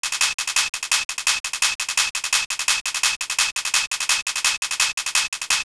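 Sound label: drum hihat, techno; PC Guide - Virtual Safari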